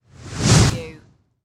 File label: Magic Spell Whoosh